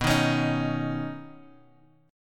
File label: B Major Add 9th B Guitar Open G